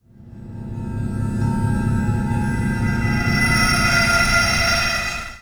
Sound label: FX Athmosphere; Vengeance Samples